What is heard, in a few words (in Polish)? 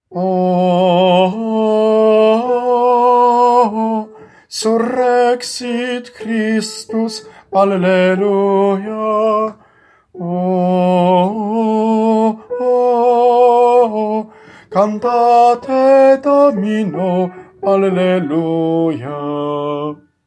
SOPRAN; Surrexit